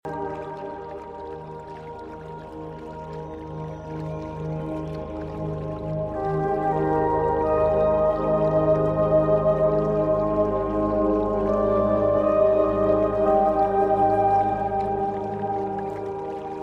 Magical Forest Stream with Celtic Flute Meditation Music & Distant Birds; Loopable